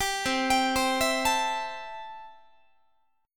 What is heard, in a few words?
C 6th C down Guitar; arpeggiated; 22,20,22,22,20,20